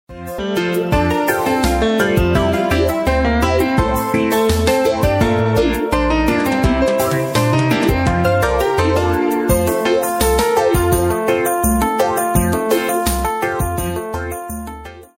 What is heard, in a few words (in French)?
Instrumentale